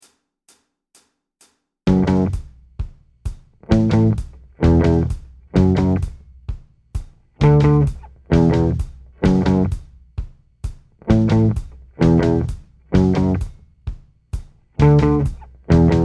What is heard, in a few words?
riff, guitar